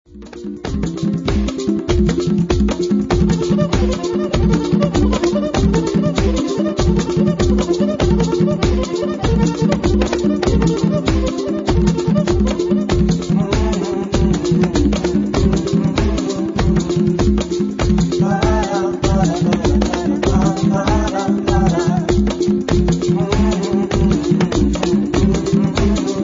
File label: Percussion Discussion